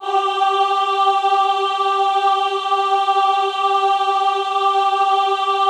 AHH G3, Classical Choir; Best Service; Extended Classical Choir; AHH MIX

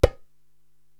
Baya Mute; Korg TR-Rack

